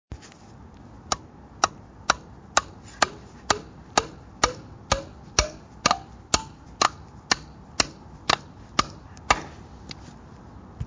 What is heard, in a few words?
hammer nail